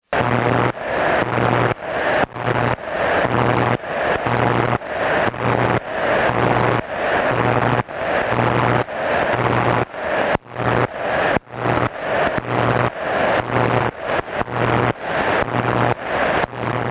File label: Noise